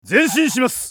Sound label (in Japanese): 移動; ナル